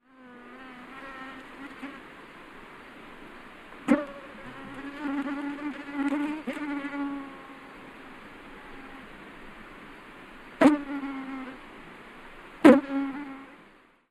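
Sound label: mosquito sound; komar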